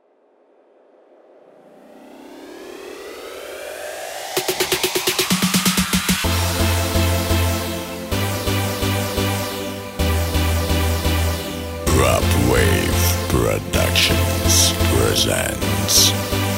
Summer Beat

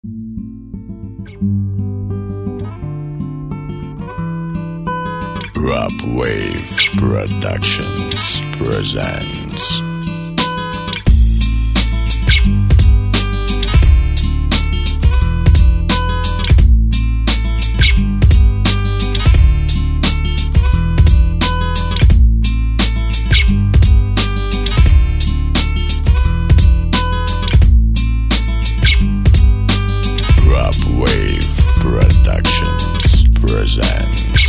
guitar new beat

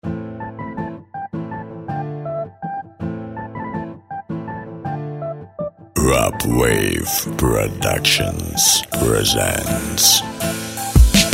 Brazen Beat

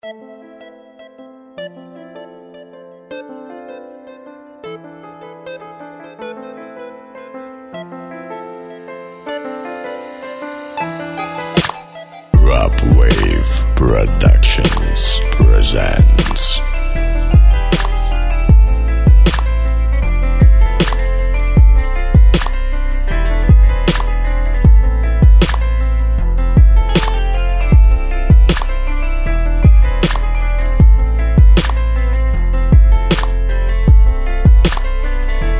RnB new

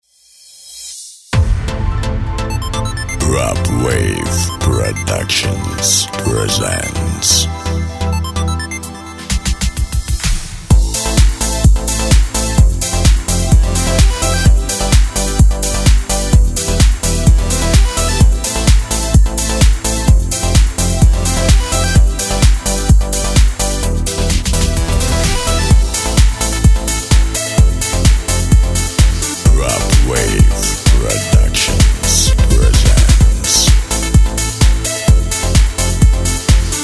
Pop Dance

